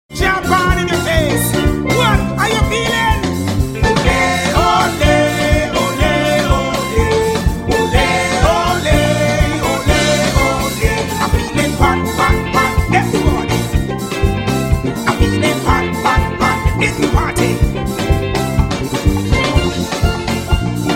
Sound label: Caribbean Steelband